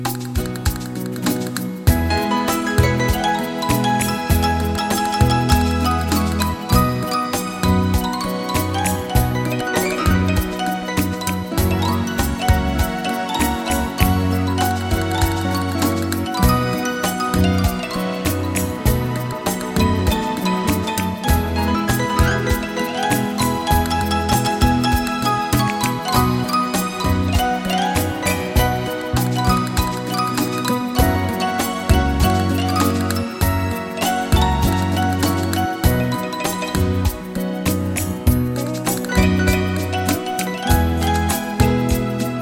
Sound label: Trio Bolero